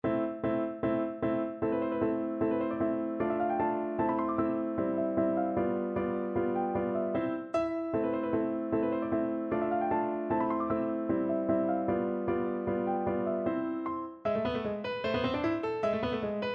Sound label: arabesque piano; burgmuller